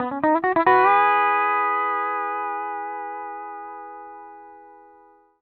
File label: LOOPS 140, Country